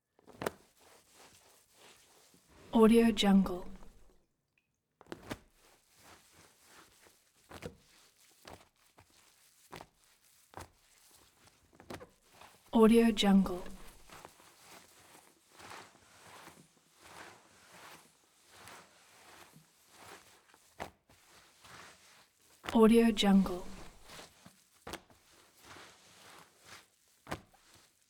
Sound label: scrubbing floor with small rag; collanderson